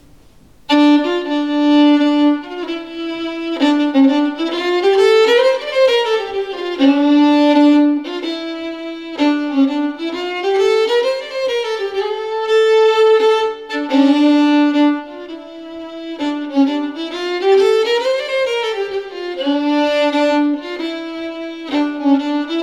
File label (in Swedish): Polska; Polska e Jonk Jonas Härjedalen